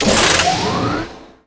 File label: lift start; Tony Hawk's Project 8; XBOX